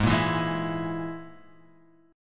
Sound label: Ab Suspended 2nd Sharp 5th, Absus2#5 chord; strummed; JGuitar